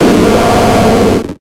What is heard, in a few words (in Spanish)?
Grito; Ursaring